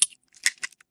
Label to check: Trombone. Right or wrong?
Wrong